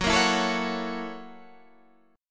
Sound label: F sharp 7th Sharp 9th F sharp down